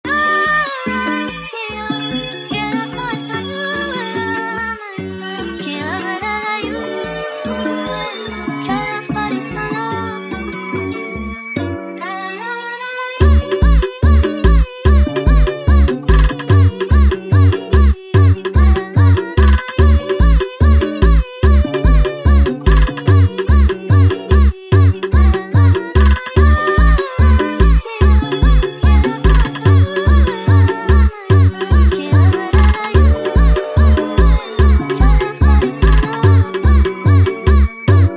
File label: Funk speed Up